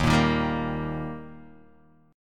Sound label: Eb Major, down, Guitar, DADGAD